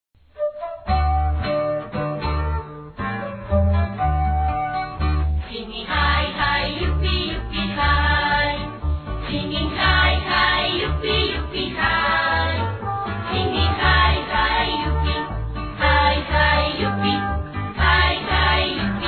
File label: singing high